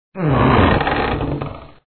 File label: Door Open; snowline